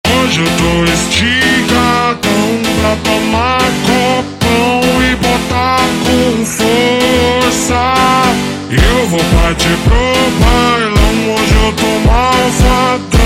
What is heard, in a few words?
Jumpstyle super Slowed